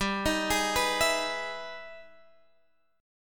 E Major 7th G, chord; arpeggiated; Emaj7/G; JGuitar

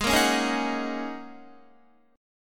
Ab 7th Suspended 2nd Suspended 4th down Guitar; strummed

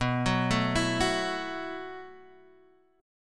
B 7th Suspended 4th B down Guitar Half Step Down; B7sus4

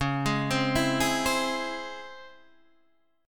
Db Minor Major 7th, Guitar, Open D

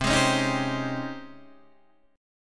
G sharp Major 11th C

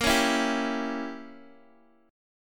Bb Diminished 7th Bb down Guitar Open G; strummed

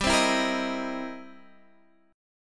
C 7th Sharp 9th G

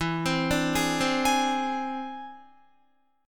E Augmented 7th E down Guitar; E+7; arpeggiated

